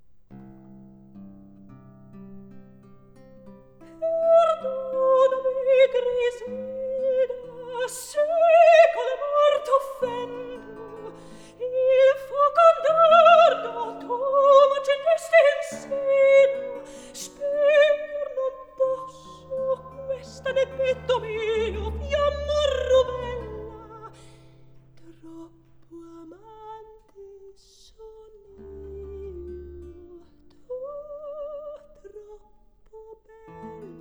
Recitativo; Atto II; Scene 7; (Ottone); Perdonami, Griselda

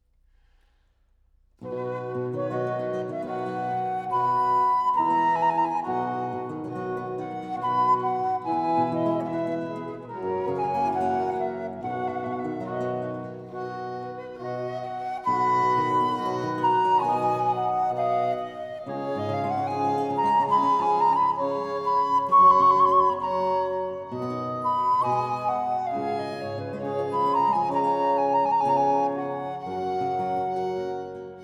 Concerto in F major, II Largo; Antonio Vivaldi (1678 - 1741); Vivaldi - Concerti da camera (2003); RV 99

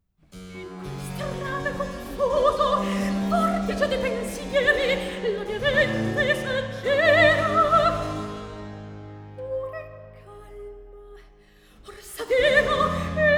Cantata - Recitativo; Rv 651 'Amor, hai vinto'; Antonio Vivaldi (1678 - 1741); Vivaldi - Concerti e cantate da camera II (2004)

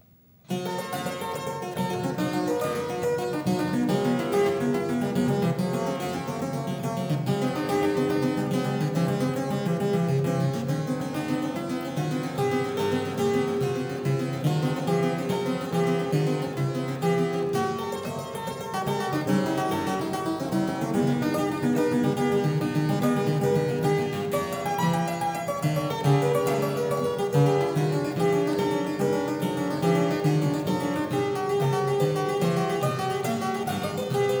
Prelude 15 in G; BWV 860; Bach - WTC - Tilney

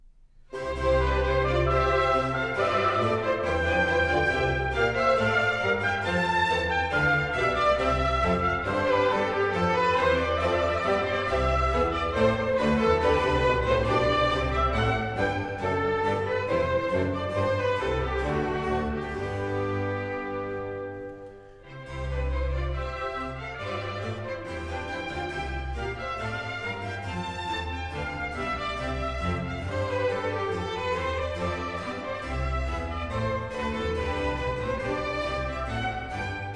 Orchestral Suite Courante; No. 1 BWV 1066; Bach - Orchestral Works, Karl Munchinger

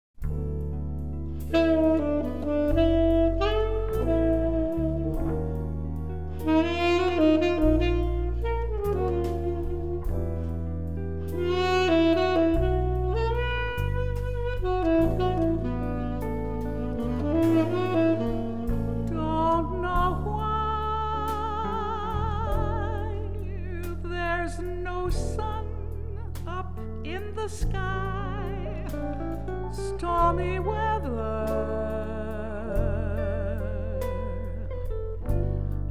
Stereo, Out of Absolute Phase; XLO Reference Recordings; XLO - Test & Burn in CD (Gold)

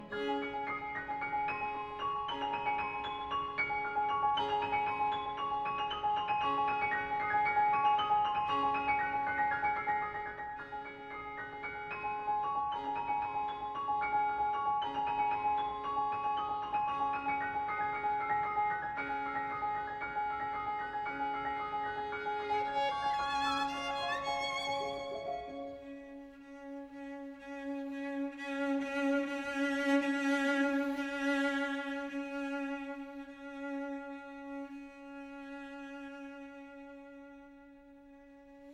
Piano Trio in A Minor, Var. V. L'istesso tempo; Tchaikovsky Arensky

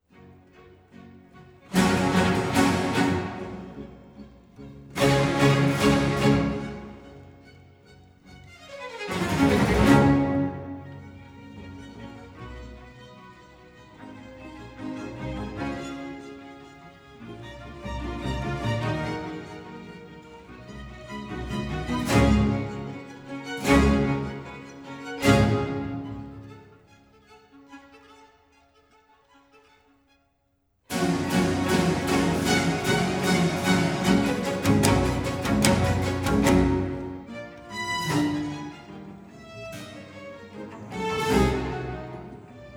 Sinfonia, Allegro; Antonio Vivaldi (1678 - 1741); Vivaldi - La verita in cimento (2003)

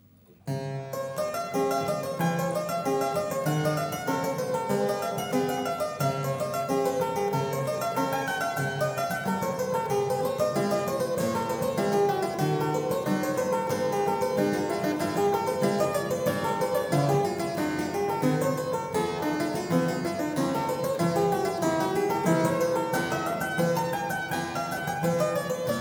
Prelude 5 in D; BWV 850; Bach - WTC - Tilney

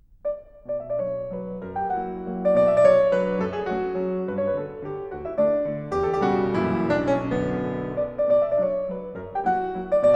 Mazurka in B minor F sharp minor, Piano

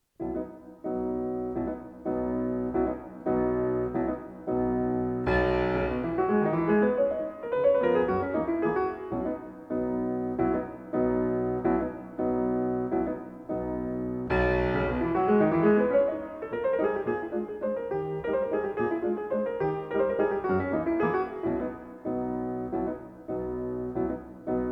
Mazurka, Piano; Chopin; Stefan Askenase

